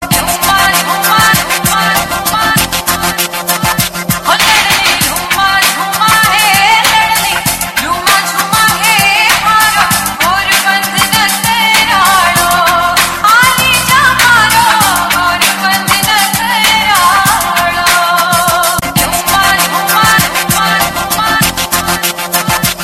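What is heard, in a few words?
Rajasthani Garbo; Rajasthsni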